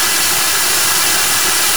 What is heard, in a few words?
flare burn loop, flaregun; tools